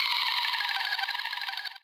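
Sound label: guitar drip; Rawcutz - Nu Forms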